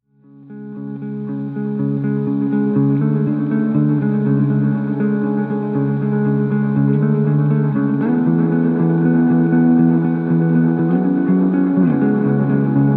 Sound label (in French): guitare